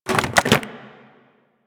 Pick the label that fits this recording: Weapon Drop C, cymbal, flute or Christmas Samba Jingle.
Weapon Drop C